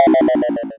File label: game lose negative